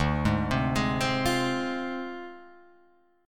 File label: D Minor 7th Flat 5th; Dm7b5; arpeggiated